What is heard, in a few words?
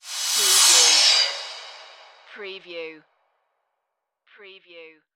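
Production Element Cymbal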